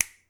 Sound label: Snap; Blind Survival